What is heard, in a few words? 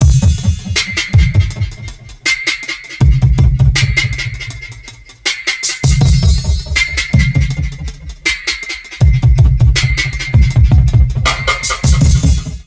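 neuro, drum loops crazy processed, 80 bpm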